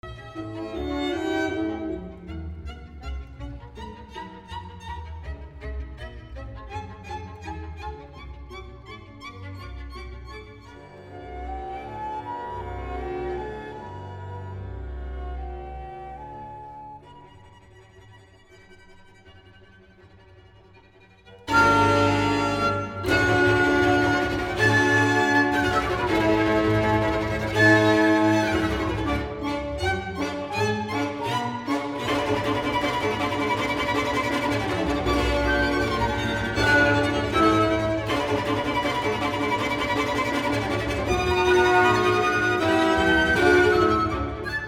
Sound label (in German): Sinfonie Nr 2 IV Allegro molto; LvB